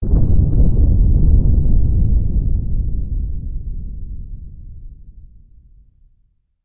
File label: Amb Destruction